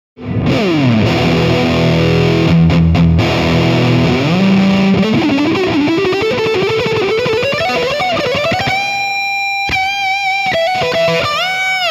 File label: bigmuff, AmpliTube Metal; ik multimedia